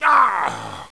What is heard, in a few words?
die; Caesar III